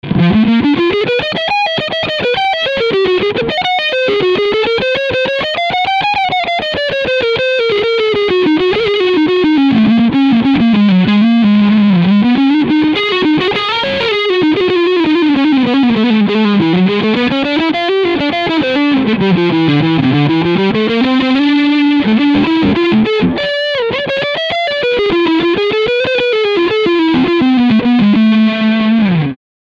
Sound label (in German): Schecter Traditional E Gitarre Leadsound Front Pickup; Nick Johnston